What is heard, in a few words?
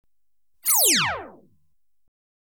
Hand Phaser Weapon (Single Shot), Electronically Generated Sounds; BBC Sound Effects Library